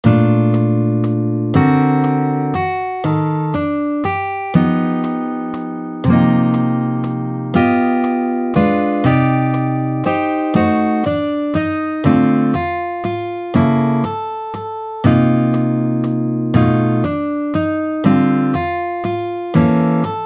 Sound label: guitar solo